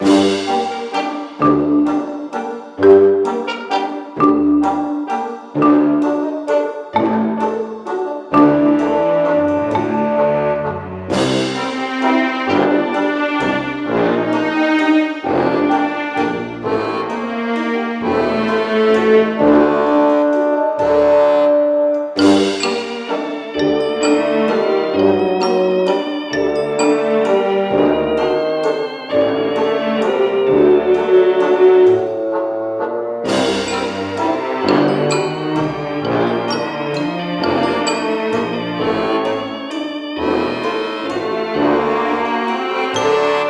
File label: gramaphone Start Screen vinyl; Don't Starve Giant Edition; PS Vita